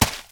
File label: mud step; Golden Crayon Sounds